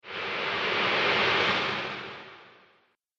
Subtle Whoosh Waves In Space

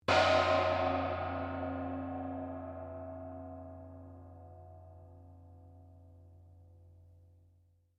GONG CHINA MEDIUM RING, Chinese gongs; Cymbals; Musical

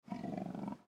Leopard Purr; Leopard Sound Effects; Animal

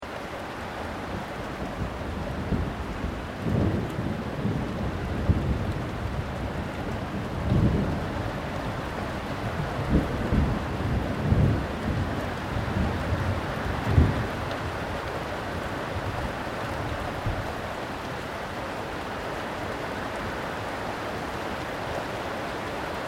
THUNDER DISTANT LOW RAIN; Elemental weather effects; Thunder (Sound Effects:Weather)

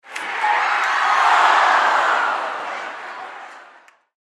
Crowd Arena Yeah, Crowd Arena Yeah Oh; Crowds; Backgrounds; SoundEffects-Blastwave; Sports

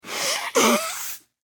Human Male Nose Blow; Sick (Sound Effects:Human)

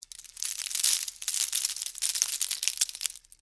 Texture, Debris, Spill, Sugar, Cone, Waffle

